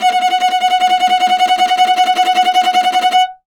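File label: viola tremolo F#4; Orchestral; DirectWave; Varazdin Orchestral; Viola II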